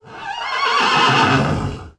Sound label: Horse Neigh; Playable Tutorial Level